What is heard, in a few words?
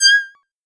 cat like